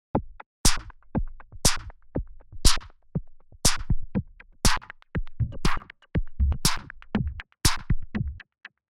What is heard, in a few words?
Clapper